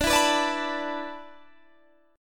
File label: D 6th Suspended 2nd D, chord